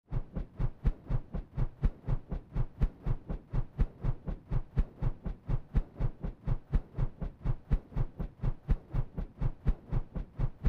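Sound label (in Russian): вращение лопастей вентилятора рассечение воздуха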